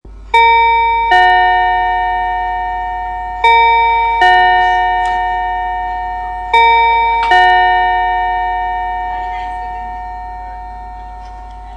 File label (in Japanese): pinpon